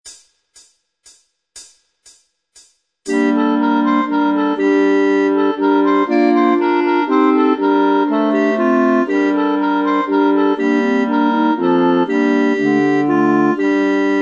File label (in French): Tourdion